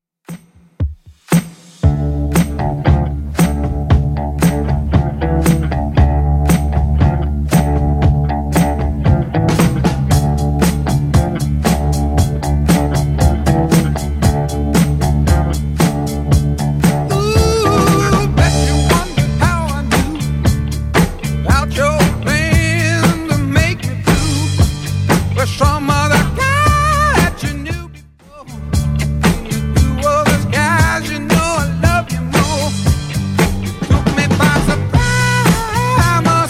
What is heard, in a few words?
70s Redrum 116bpm